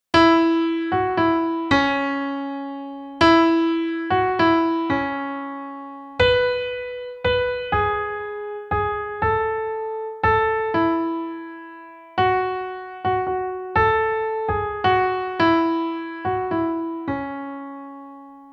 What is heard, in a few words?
piano, bad improv ending